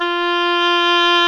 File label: DOUBLE REEDS; OMI - Universe of Sounds